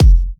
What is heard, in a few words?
Bassdrums